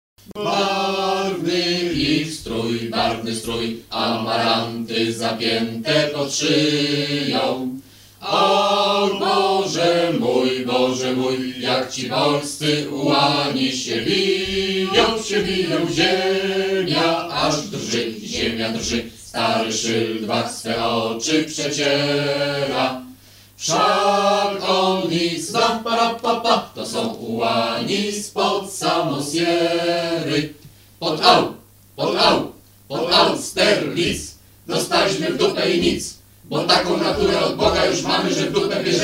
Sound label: Hymn; AKTK Bystrze